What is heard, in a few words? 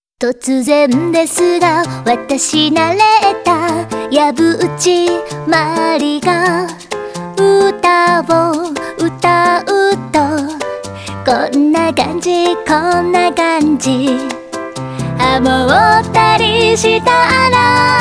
song